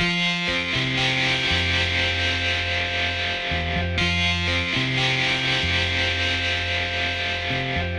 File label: Reverb Tone; Samsung SGH-T839 Sidekick 4G; ringtones